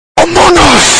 Guy yelling earrape; among us

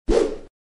bamboo stick swing

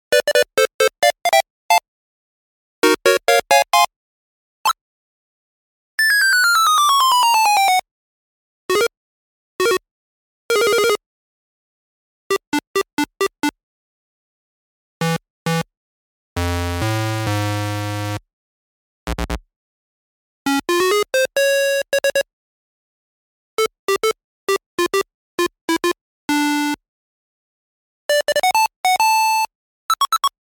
8 bit retro video game sound effects

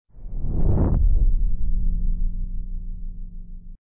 cinematic hit

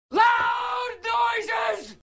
Loud noises gaming

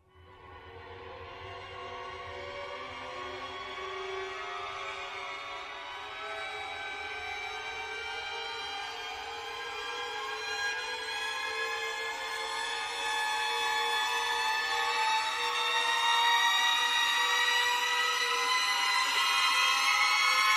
horror increasing violin

